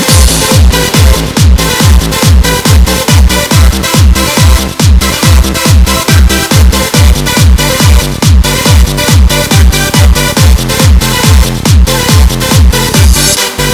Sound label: beat, party